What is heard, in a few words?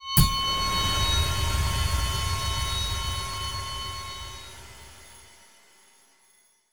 Room Transition; gamerip; 2 Bit Shorter